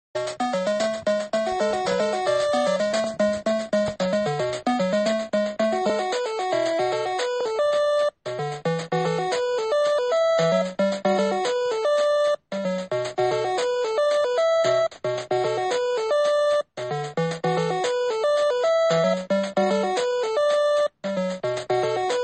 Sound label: Triangle; Cobra Triangle